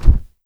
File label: flap; Politburo